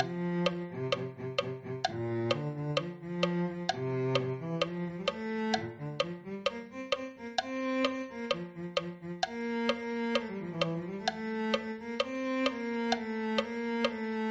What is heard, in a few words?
cello